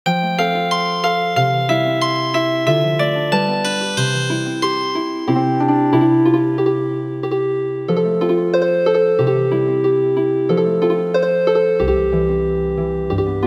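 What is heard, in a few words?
little fox Healing Harp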